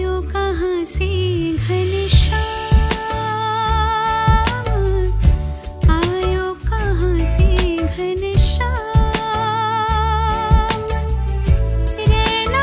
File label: Devotional Ringtones; Jai Shri Krishna